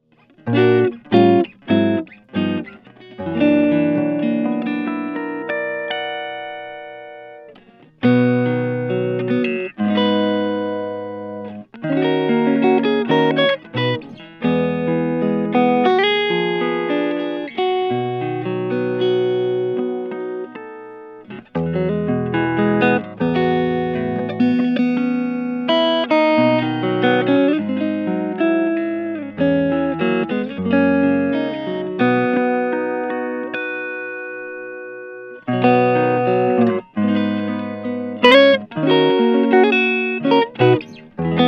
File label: 16 ferrari red; marchione